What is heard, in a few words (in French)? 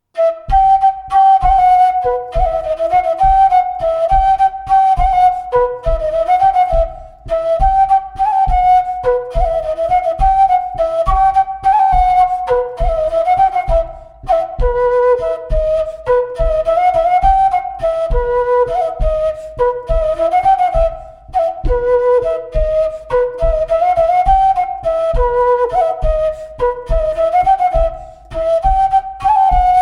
Montagnarde; Recueil De Raoulx